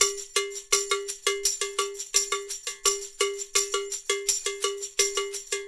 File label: Percussionworks; Sampleheads; NYC Percussionworks; 90 s Sample CDs